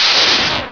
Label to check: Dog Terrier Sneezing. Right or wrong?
Wrong